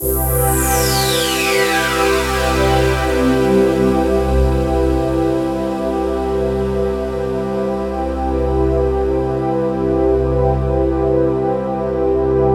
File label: MAJ, Synths; JD-800